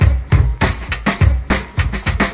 102 backnforth, drum loops and breaks, 101 to 110 bpm